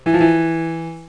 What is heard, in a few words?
mordent